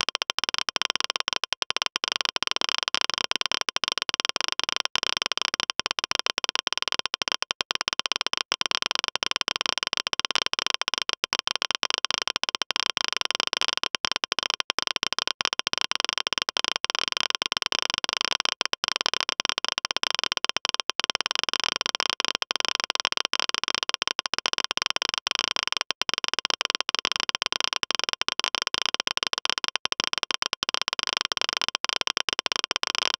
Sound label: geiger counter clicking; nuclear